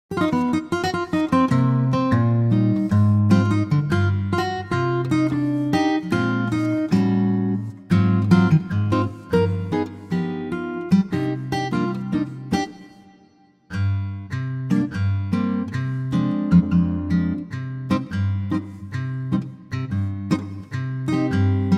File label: Cm Ac Gtr Latin, male